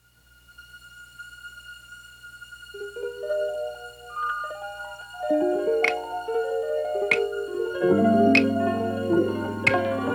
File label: Brazilian Groove (no drums, no vocal)